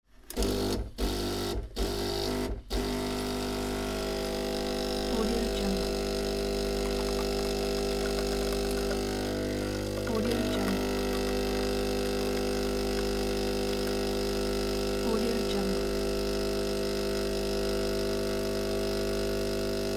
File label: coffee machine